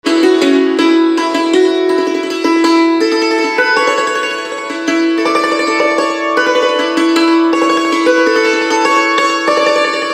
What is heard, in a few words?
Santoor Instrumental Ringtone